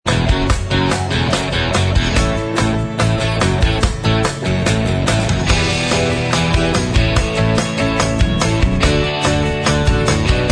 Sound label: Inst Track, backing tracks